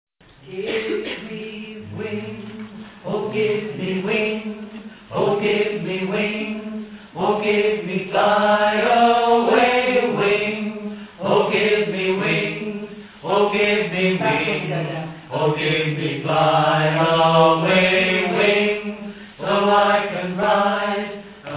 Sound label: baritone